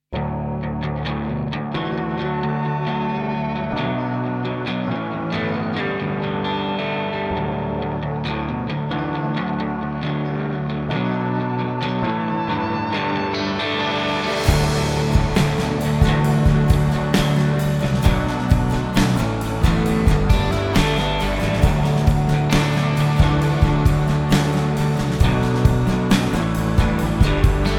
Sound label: Lazy Soundscape